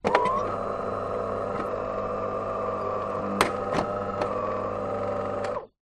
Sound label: coffee machine delonghi whirr start up